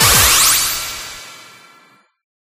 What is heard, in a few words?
Particles; Life of a Naomi